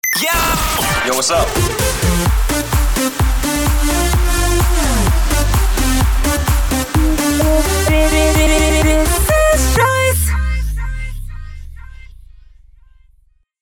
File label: Remix Jingle